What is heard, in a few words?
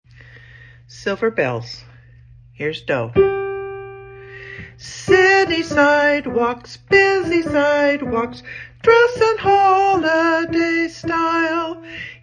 lead